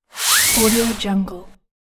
screwdriver; chicasound

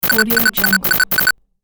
robo beep machine

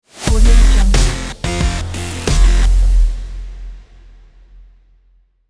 cyberpunk logo

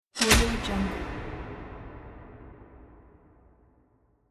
stadium lights switch

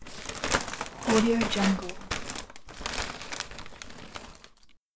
potatoes bag

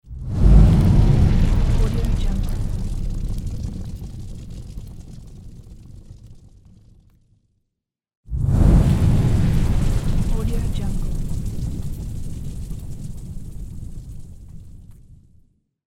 fire movement

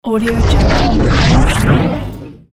scifi transition

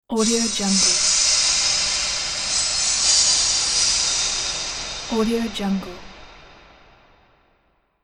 epic fantasy metallic noise

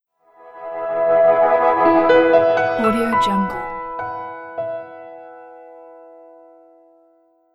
the christmas logo; greenrocketaudio

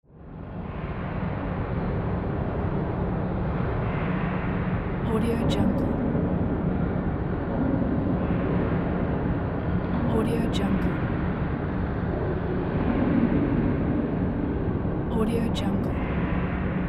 unnerving mechanical ambience

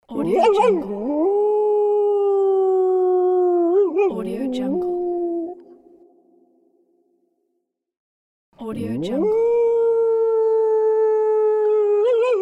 wolf howling

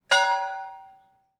church bells; prosoundfx

